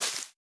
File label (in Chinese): 杜鹃树叶破坏声